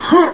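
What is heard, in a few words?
player jump; Quake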